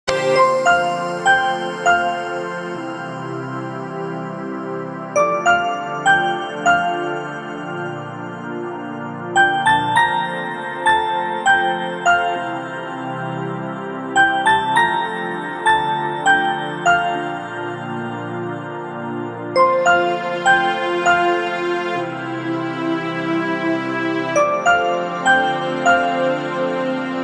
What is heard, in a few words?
Instrumental; Hemasi